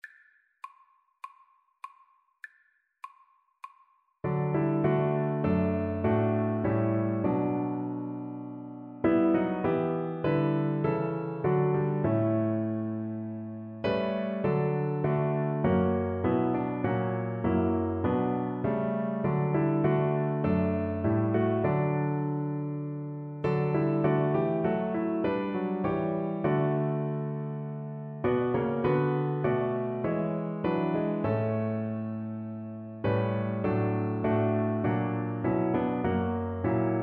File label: cello